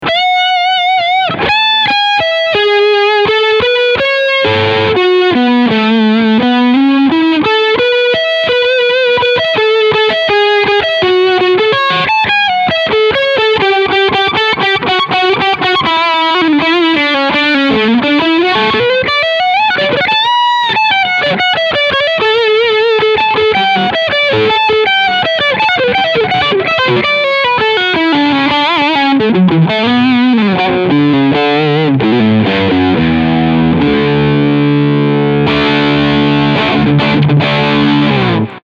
Leadsound Steg SC

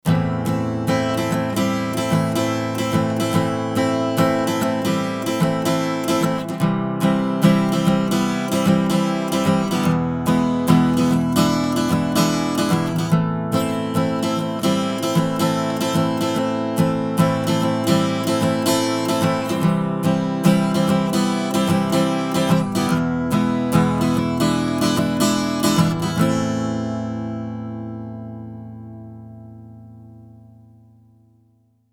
Gitarre; Tegeler Audio Vocal Leveler 500; ohne VL 500

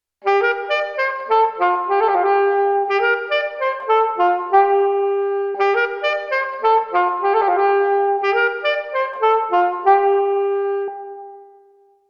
Sony DRE 2000 Saxophon Beethovenhalle Bonn

